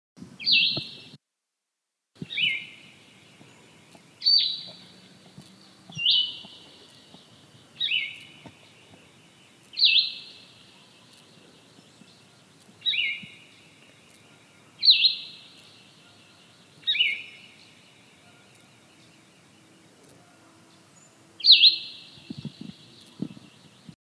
Blue-headed Vireo, Vireo solitarius

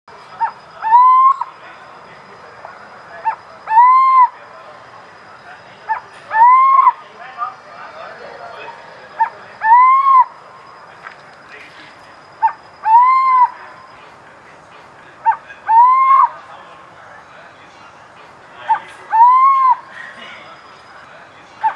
Burrowing Owl, Athene cunicularia; owls

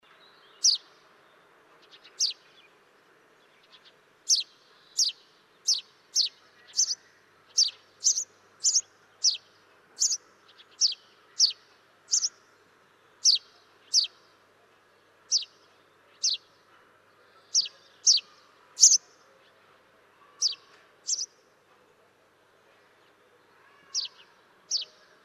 House Sparrow Passer domesticus; sparrows

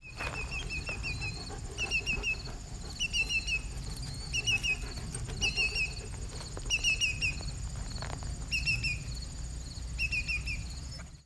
Greater Yellowlegs, Tringa melanoleuca; shorebirds rails

